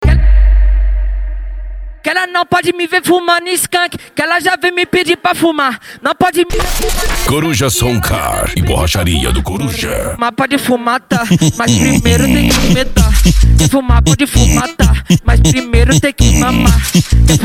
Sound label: funk; coruja som car e borracharia do coruja